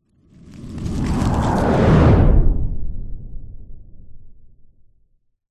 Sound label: sound design whoosh burning lead into strong powerful whoosh; zapsplat